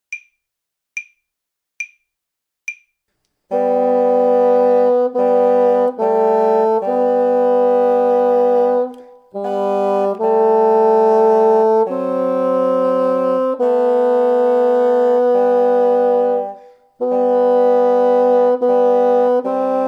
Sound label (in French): poeme 3 bassons, basson; poeme trio